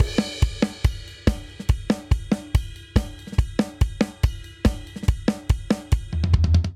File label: Syncopated Folk Outro 142 bpm; MIDI Clips; Ableton; Factory Packs; Drum Booth